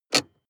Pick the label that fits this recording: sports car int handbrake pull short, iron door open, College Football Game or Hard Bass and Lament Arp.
sports car int handbrake pull short